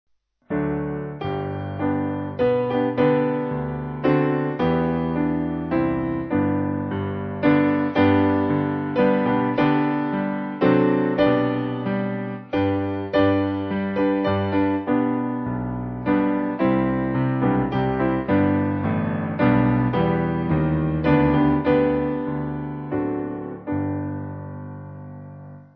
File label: Amazing Grace Piano